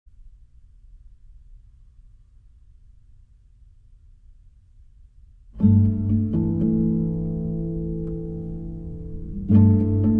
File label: Guitar Quartet